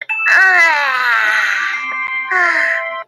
cute yawn

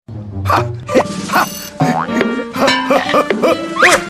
laughing; tigger; tries laughing like everyone else

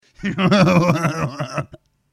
giggle

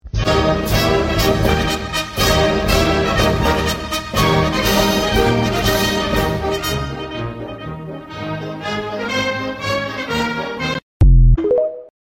monegasque national anthem; charles leclerc

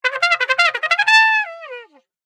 silly trumpet

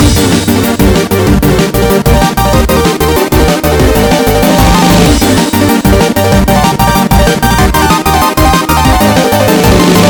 warrior theme; animation

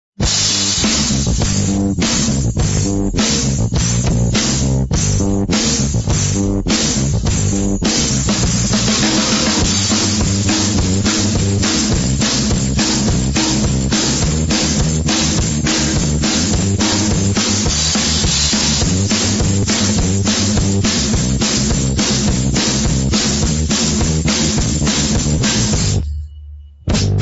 drum hard style

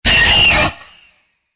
screech doge

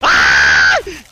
ahhhh; oni

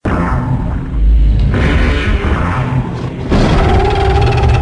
male muto roar

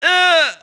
eeuuhhh

